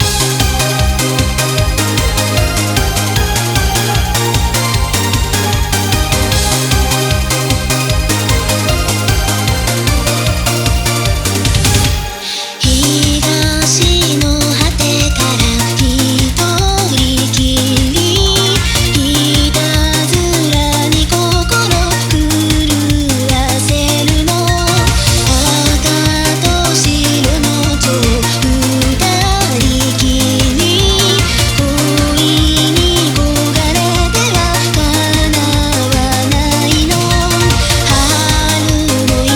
EUROBEAT STYLE

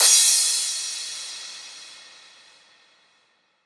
Crash

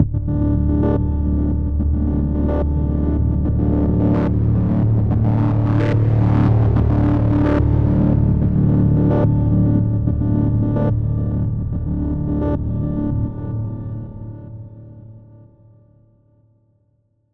Pad, PsyTrance

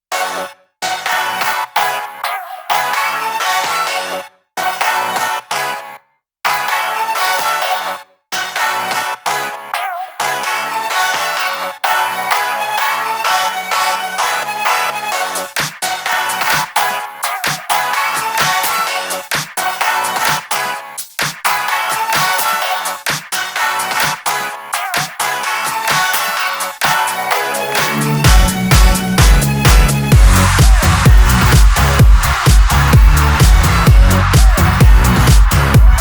Instrumental

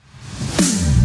Big Snare

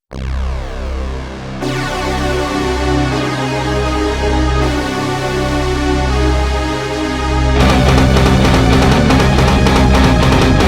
instrumental

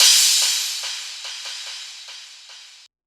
Cymbal, PsyTrance

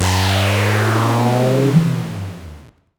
Zap, PsyTrance

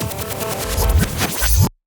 FILL, PsyTrance

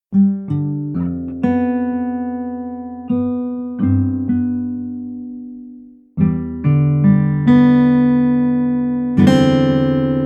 ordinary soundscape

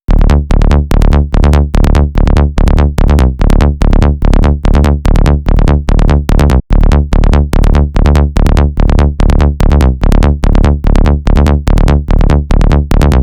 Bass Fullon, PsyTrance

